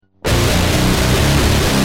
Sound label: Guitar Rage, Industrial